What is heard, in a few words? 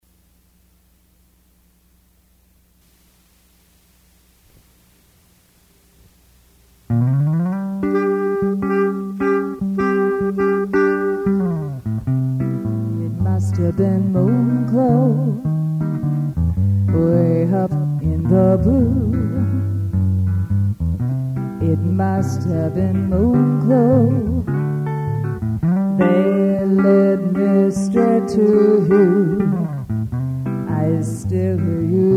trio sax